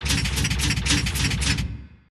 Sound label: robot walk; boss